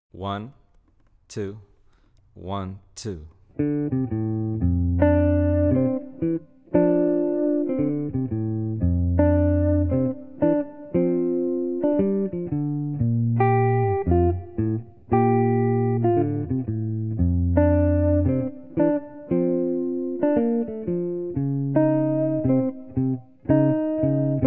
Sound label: no Gtr